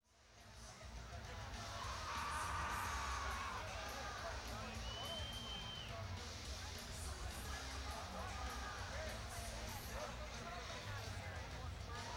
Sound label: Intro Jam, Live at Irvine Meadows Amphitheatre; Irvine, CA; 1996